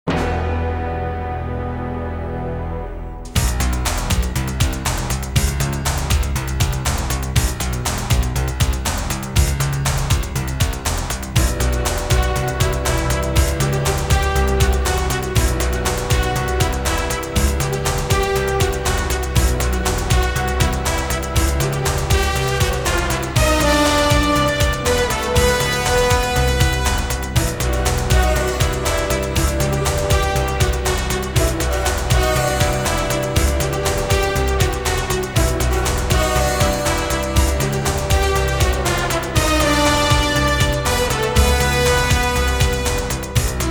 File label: cello chase